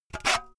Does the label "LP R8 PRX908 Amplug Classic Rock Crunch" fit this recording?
No